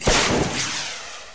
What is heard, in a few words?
ball zap; XDM